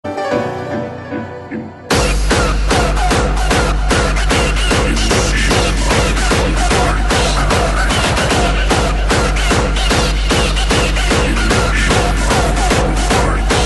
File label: hardstyle